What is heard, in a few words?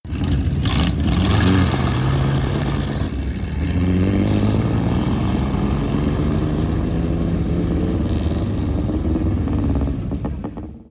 Chevrolet